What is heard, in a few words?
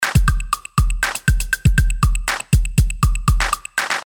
test beat